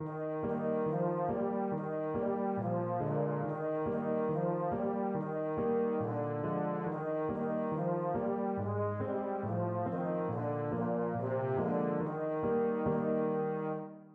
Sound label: euphonium